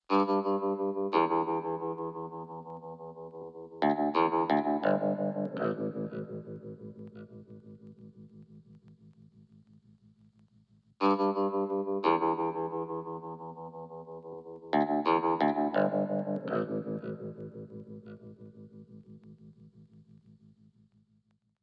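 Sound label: 88 Em Vibro Guitar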